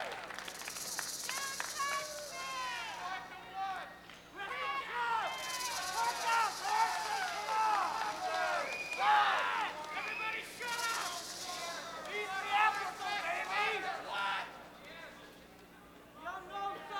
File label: The Crowd Requests More